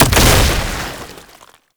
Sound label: Wall Climb Death Explosion; Warframe; Oraxia